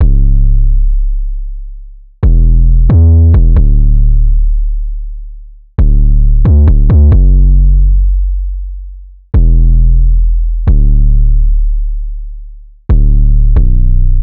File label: Synth loop 135 F#m, Synth Bass Loops; Slate Digital BASSHOLE Sample Pack